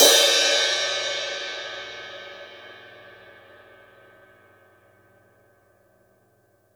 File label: Crash, drum